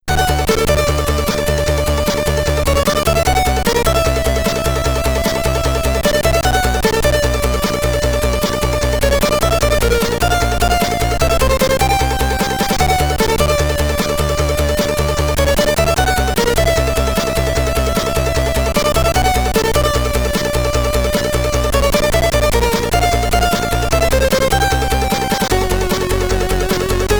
Ending SID Stereo; Startrash; commodore 64

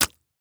human guts drop splat; a dump in the dark

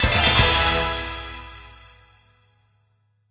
Gacha Jingle

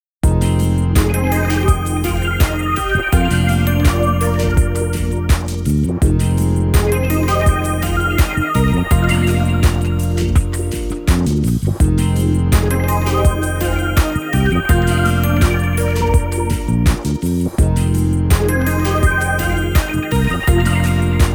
Conversation Screen; bullet girls 1 2 soundtrack

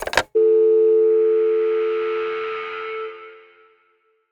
Telephone Receiver Travel; Super Crazy Rhythm Castle; PS4; Xbox Series X|S

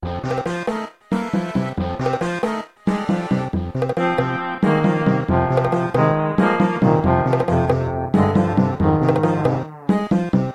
Underground; SMB2

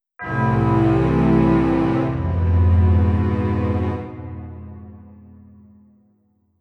Defeat

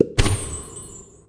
Life Lost; SFX Marathon Life Lost

